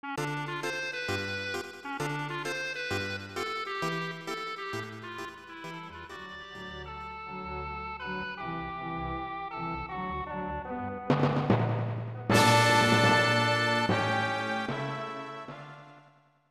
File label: Ending Interval; snes; Heracles no Eikou IV Kamigami kara no Okurimono